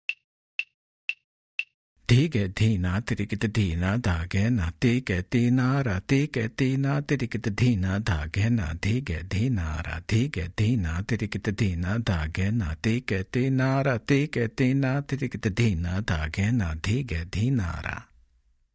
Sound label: Intro Click